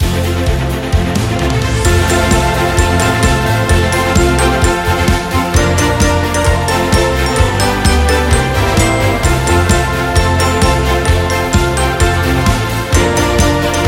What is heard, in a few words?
The Jingle Bells